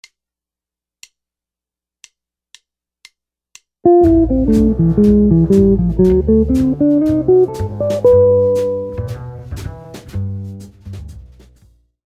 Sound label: licks, jazz guitar